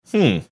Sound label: hmm